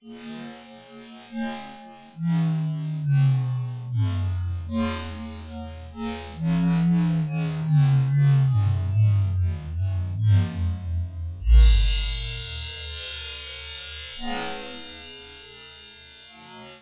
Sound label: Drum Solo